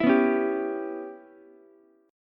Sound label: G 6th Flat 5th, Ukulele; G6b5 strummed; JGuitar